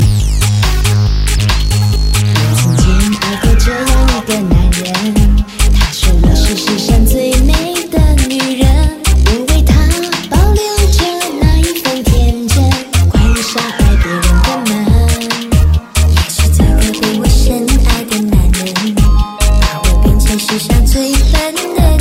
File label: Chinese Pop